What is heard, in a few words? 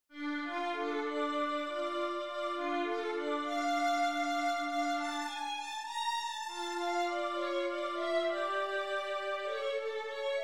etude, violin